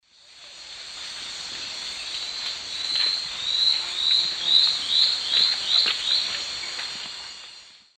Myrmotherula hauxwelli, song, S. America Eastern Lowlands